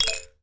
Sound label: toy musical shaker; sound effects one